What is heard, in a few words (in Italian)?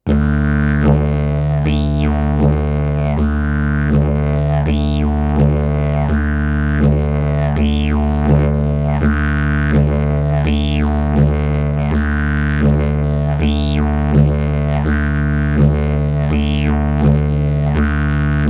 ritmo livello principiante